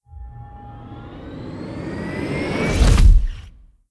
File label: Start; blue archive